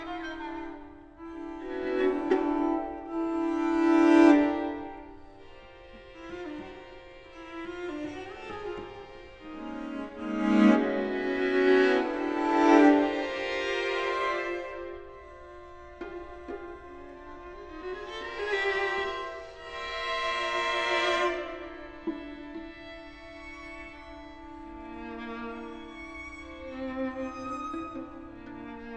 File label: classical